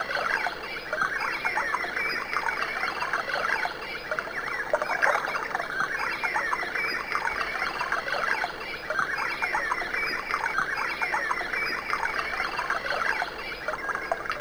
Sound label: birds, tinnitus maskers